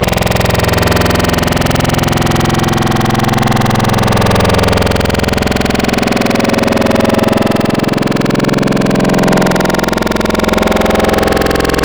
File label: Growl Tables; Bodies; Cymatics; SERUM; Growl Tables Vol 2